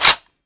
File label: rpk bolt forward; garrysmod